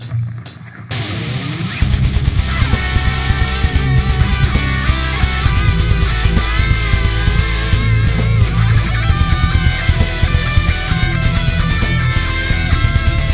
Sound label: lead